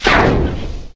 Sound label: projector; cdogs; Sand